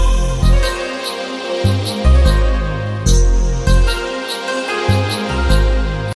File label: Sms Tune